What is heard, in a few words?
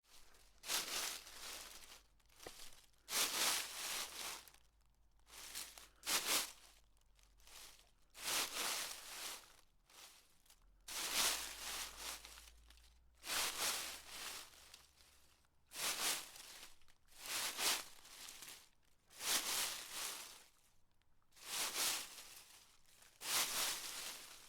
leaves rustling series